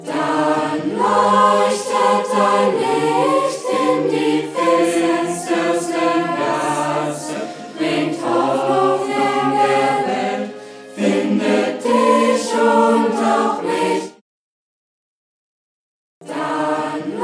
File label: chor eq